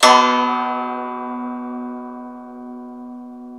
SU GZHENG, Earth Tones

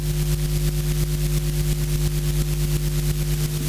Rythmic Noise 130BPM

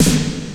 GATED S909, GATE COLLECT; SPECL FX

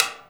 STICK; InVision Interactive; Percussion Slam; CAFFEINE

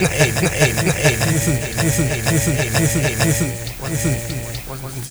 RELEASE, Disjointed Textures; USB Soundscan vol.33; AKAI; CHAOS

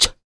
FEM PERC, Vocal Jazz FX

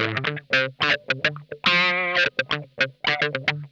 Funk Guitar; Zero G - Funk Guitar

